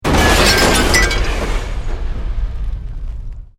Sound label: Explosion Medium Metal Glass